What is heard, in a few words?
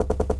steps fast